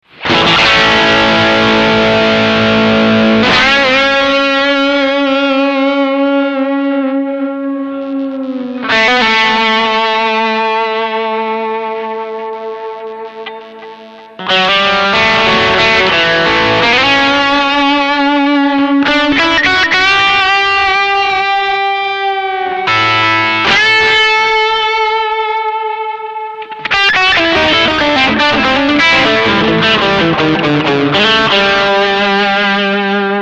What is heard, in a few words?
AD999 medium delay, pedales